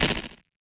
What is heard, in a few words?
perc; goodtakimu drumkit; fl studio; drumkits